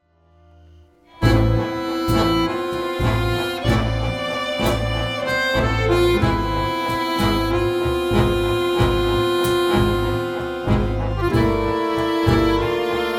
melodia in la min, tango

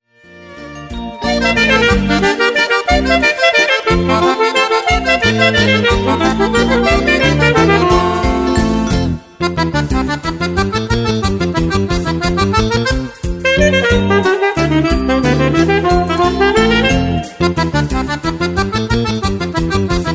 duetto

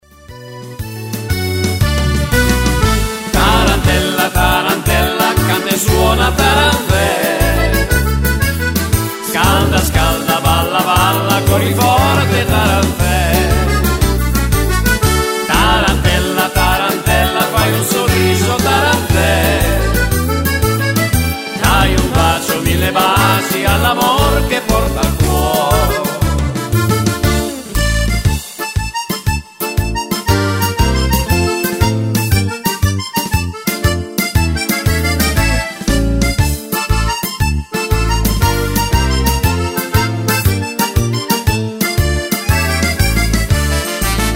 la tarantella

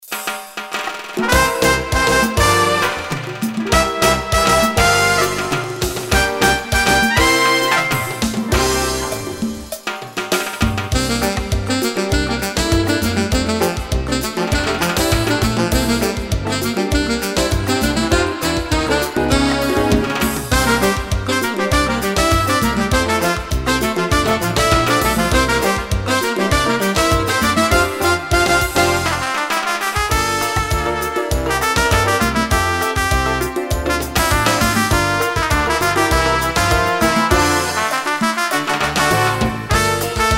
mambo

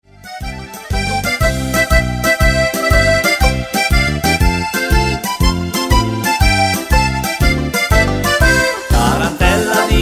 tarantella di paese

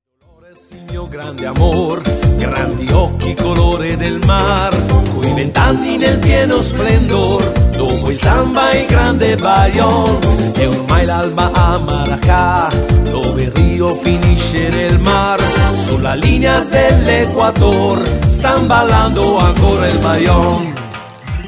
maraca

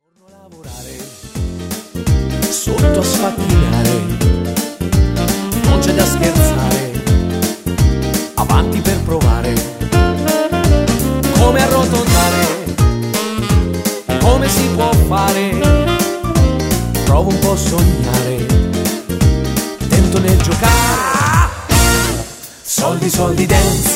dance

